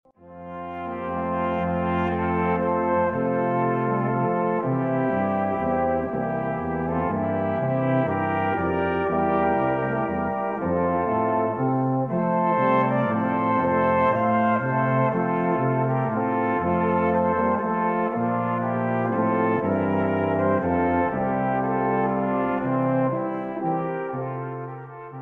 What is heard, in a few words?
Siciliana